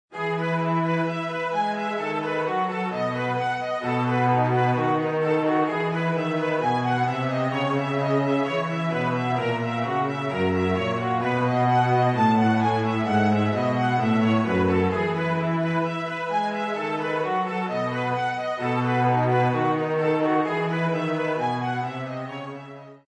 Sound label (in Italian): ALLEGRO IN LA MAGGIORE